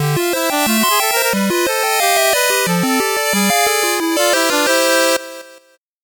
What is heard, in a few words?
8bit jingle slow